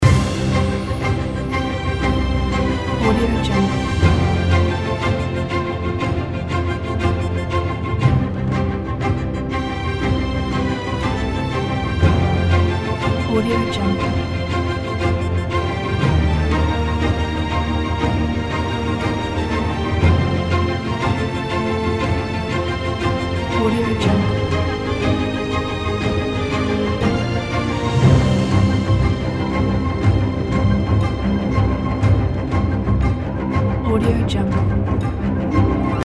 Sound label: global awards background